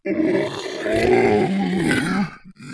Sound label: zombie voice idle; svencoop